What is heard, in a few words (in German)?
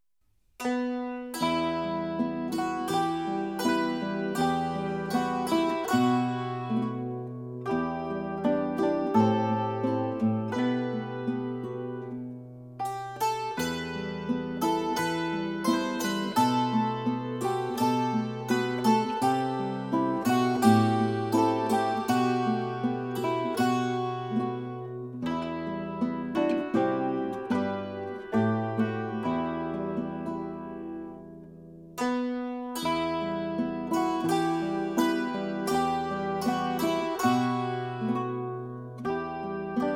Maria durch ein Domwald ging trad. Saitenmusik